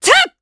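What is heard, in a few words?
Vox Attack Japanese; Glenwys; kingsraid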